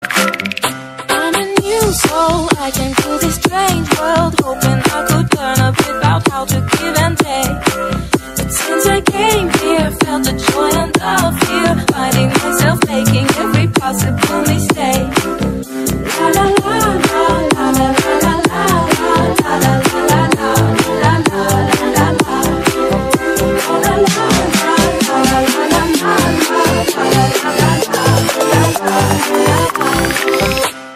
nhac chuong remix

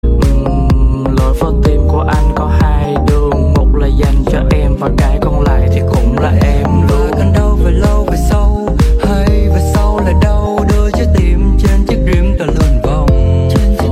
nhac chuong remix

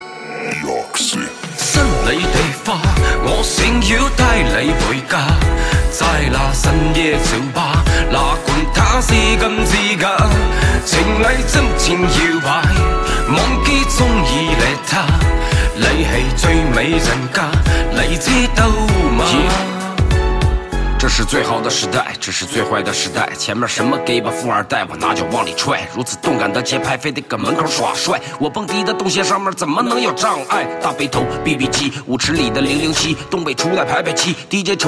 nhac chuong disco remix

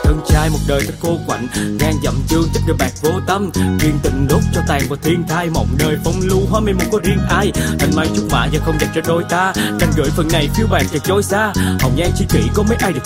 Nhac Chuong Rap

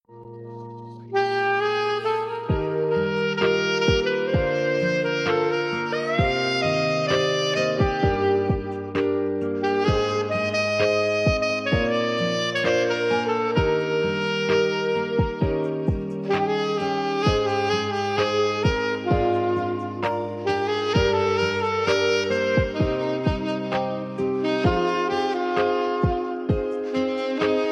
Nhac Chuong, Saxophone

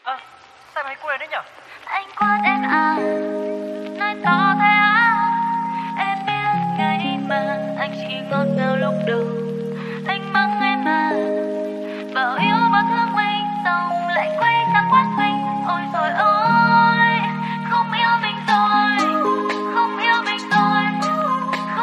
Nhac Chuong Lofi

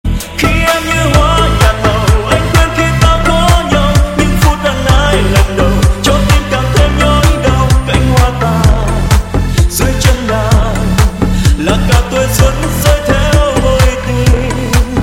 Nhac Chuong Remix

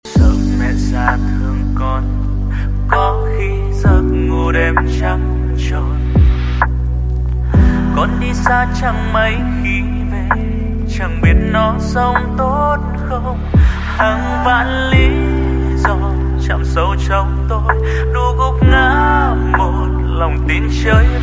Nhac Chuong Lofi

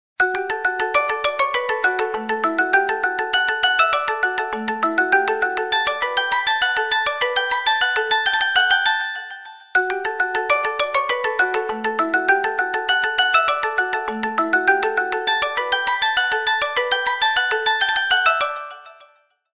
khong loi xiaomi pad 6 max 14 stock

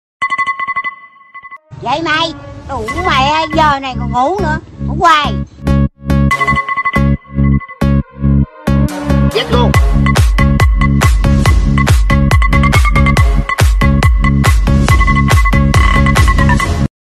nhac chuong bao thuc remix tiktok

